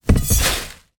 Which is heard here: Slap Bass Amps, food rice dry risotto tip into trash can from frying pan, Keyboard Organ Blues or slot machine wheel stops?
food rice dry risotto tip into trash can from frying pan